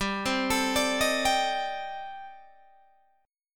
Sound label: C Minor 6th Add 9th G Guitar Standard; Cm6add9/G arpeggiated; JGuitar